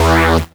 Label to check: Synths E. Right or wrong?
Right